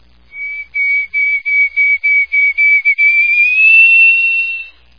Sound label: field sparrow